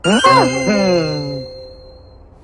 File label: points; dropsy